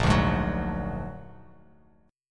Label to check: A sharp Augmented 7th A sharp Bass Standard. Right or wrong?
Right